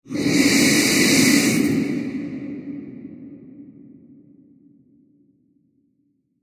Scary Transition Very Breathy; Scary Soundscapes; Horror; Crafted Signals

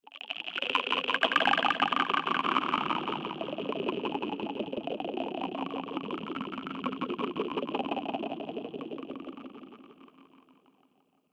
Sci Fi Alien Talk Clicks; Aliens

